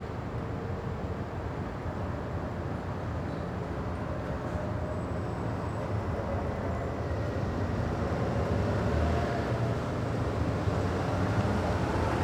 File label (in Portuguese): Carros acelerando e buzina de caminhao hora do rush praca civica; goiania